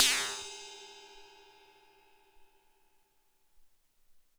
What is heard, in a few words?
FX Ride, Alesis SR-18